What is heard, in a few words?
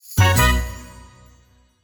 win Small; netent